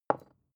glass put down small; objekte

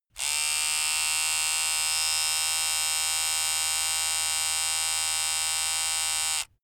razor long

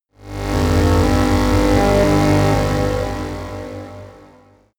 sci-fi cosmic high dark energy; horror

